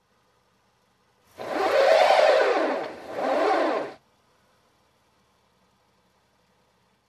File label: Truck Winch